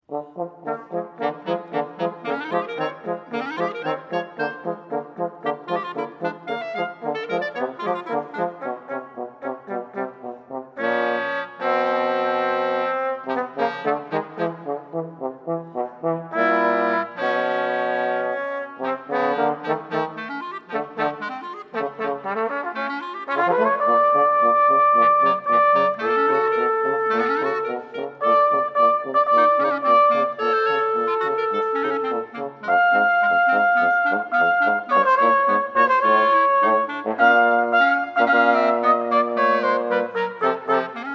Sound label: Pavane for Clarinet, Trumpet and Trombone; octet